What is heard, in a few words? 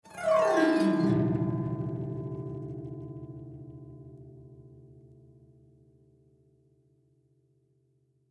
Autoharp Scale Ring Out, Cartoon Music; Musical